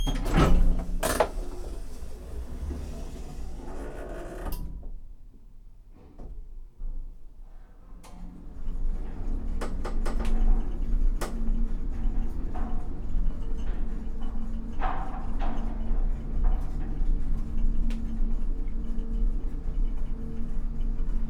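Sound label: Elevator Creaky Door Slide Rattles Moving; The Soundcatcher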